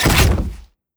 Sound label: elevator pulled, headcrab classic; headcrab classic, scripted; creatures